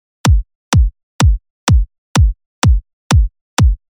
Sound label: kick